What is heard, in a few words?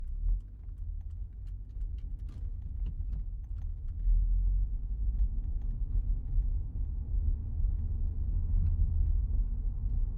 Driving situation speed bumps on road Interior suburbs stereo, Honda Accord Type S; Ivo Vicic